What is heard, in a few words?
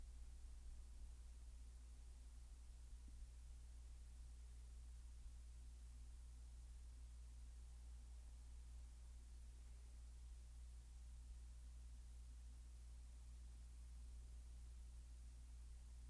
Roomtone Medium Size Room